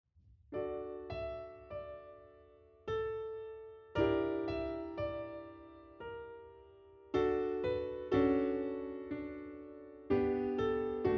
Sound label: with flute